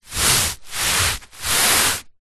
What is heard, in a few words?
sport exercise mat foam thin shift slide scrape movement on carpet